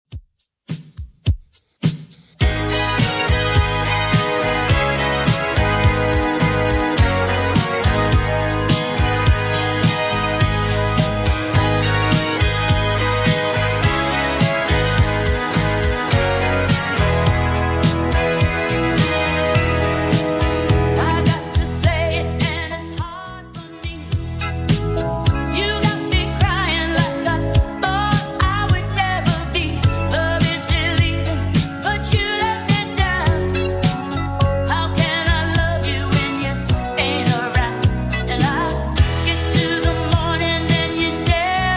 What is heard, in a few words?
80s Redrum 105bpm